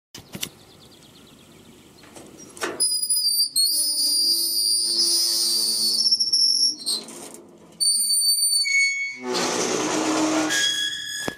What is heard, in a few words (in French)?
sortie, BRUITS DE PORTAILS; SAINT SAVINIEN (17)